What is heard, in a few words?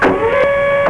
weap change Low; Nuclear Hammer